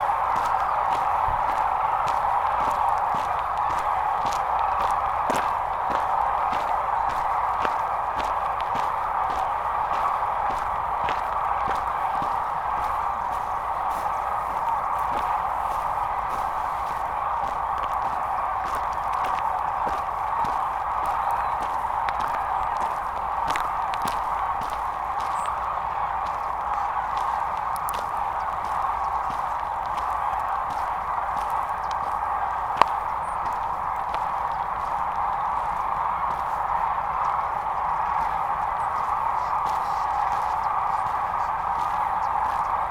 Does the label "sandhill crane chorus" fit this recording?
Yes